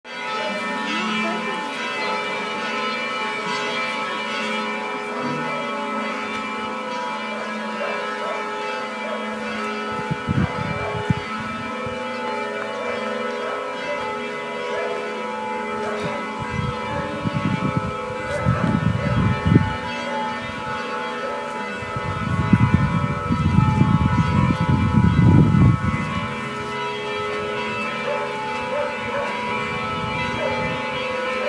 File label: bells; Satie; Casa Juljova